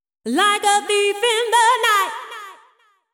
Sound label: deep house female, 125, A, vocals